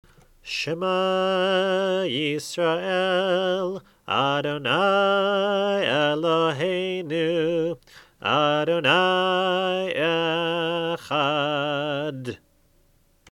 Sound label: Shma first line